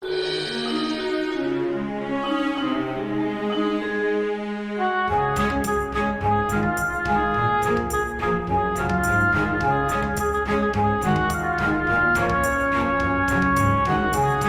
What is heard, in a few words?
Orchestral Cover; Pony Music Archive 19.03